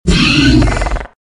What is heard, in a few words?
Velociraptor - Growl; RadwinIsland